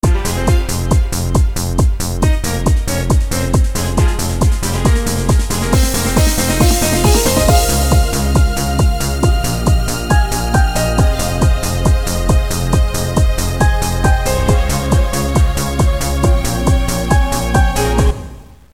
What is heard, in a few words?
dream trance